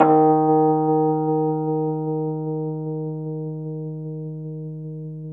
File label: Rhodes 73 Slow; 3D Rhodes